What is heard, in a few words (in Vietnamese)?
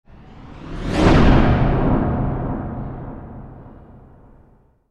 Nhac chuyen canh phim